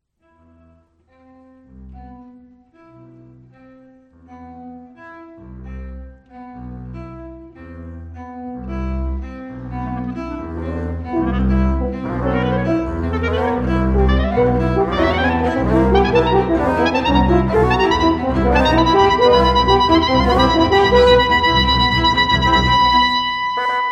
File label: Lift Off